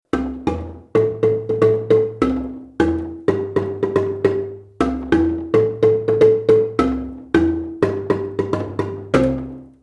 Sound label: bass end line, marimba